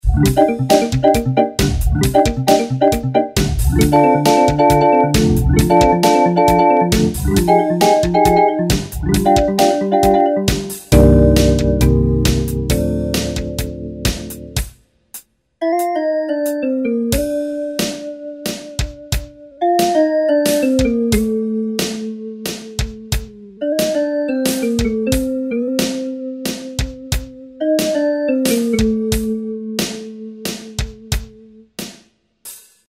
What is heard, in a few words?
chemical organ